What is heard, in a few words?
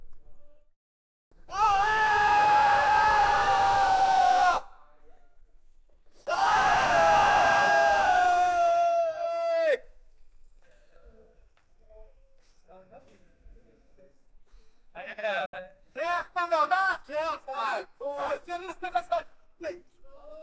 the sounds of ak 47 and, automatic rifle